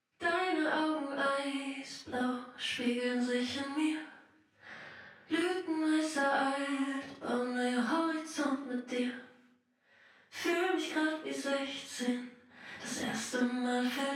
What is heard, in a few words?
Reverb Back and Side Walls Mid Filling